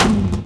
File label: antilag ext; Rally Xtreme; cars